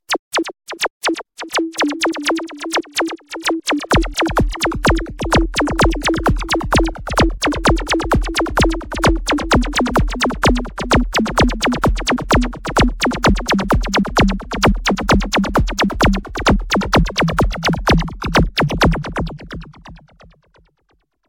Bass Drum

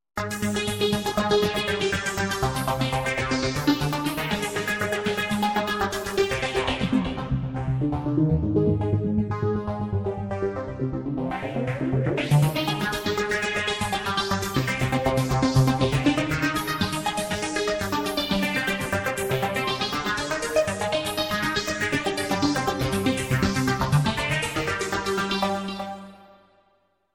Arp; ArpaceasBE

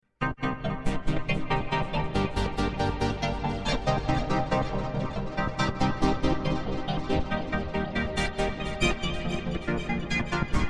LFO SYNC

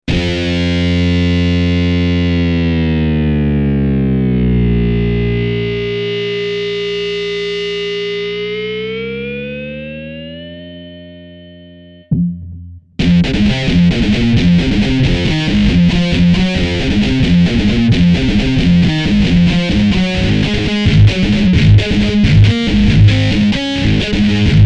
MetalMute; S90

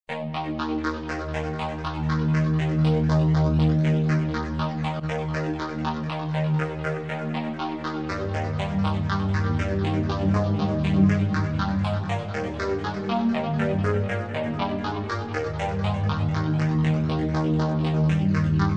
Arp

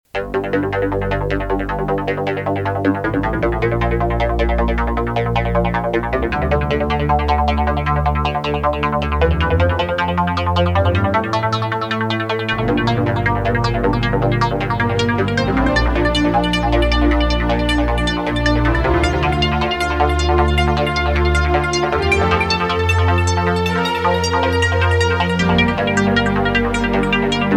ARP

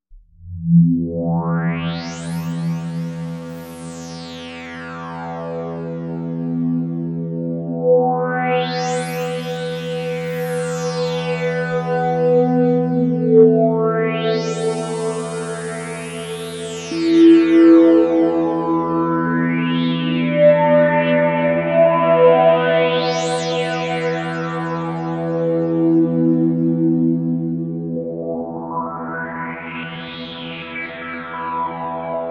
Sweep

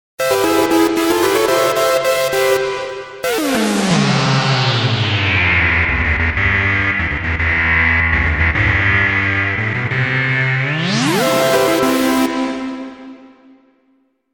FM Hornet

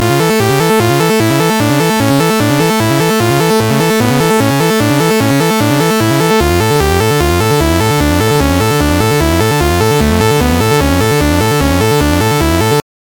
TOCCATA E FUGA SAW

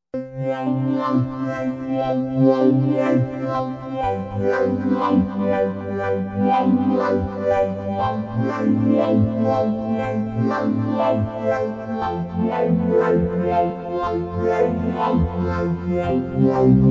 FILT LFO

